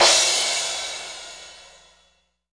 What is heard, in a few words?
Alesis Crash